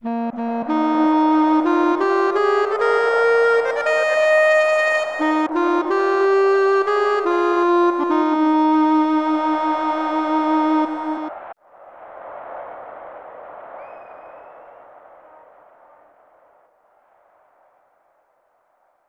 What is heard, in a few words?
American National Anthem; Ken Griffey Jr. Winning Run; SNES